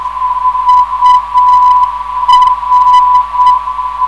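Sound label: UR 425kHz, non-directional beacon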